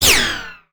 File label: Space Gun; Sci Fi; 1001 Sound Effects